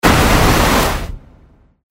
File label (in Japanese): 水魔法 小; モノラル; 魔法効果音